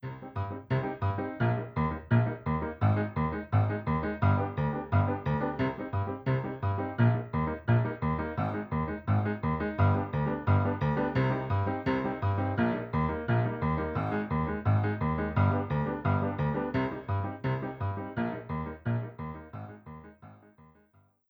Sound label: Piano Compare